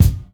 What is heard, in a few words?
natural bass drum F sharp key; kick drum; kick drum samples